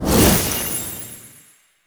Horse Racing Portal Touch; Warframe; Duviri

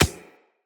Beach Ball Bounce Dirt

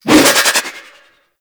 Pet Spin Whoosh; Warframe; Alad V Pet; Foley

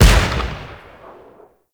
Cannon Battery Fire; Warframe; Railjack

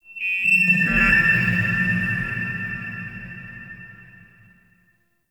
Tech Texture Large, Ambience; Warframe; Cephalon Weave

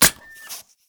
Shotgun Fire Empty; Warframe; Tenno; Alchemist

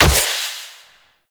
Reaper Wall Run Step; Warframe; Wraith

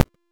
Crack Impact; Warframe; Warframes; Khora

